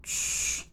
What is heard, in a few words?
Old Men Shh